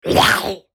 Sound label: attack; Legends Of Coyote Gulch